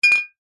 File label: foley bottles x2 beer full single knock together clink; zapsplat